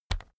keyboard external key push

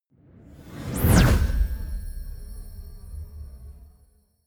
whoosh shimmering zap

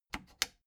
plastic button latch loose click disable slow

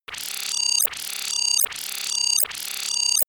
incoming communication signal

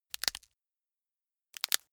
crack knuckles

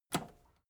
metal button robust push enable

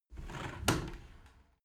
window open tilt soft sequence

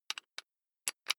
electric drill bit fasten wrench apply

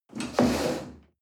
chair wood slide on floor hard bump

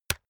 keyboard external space hit push

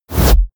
push

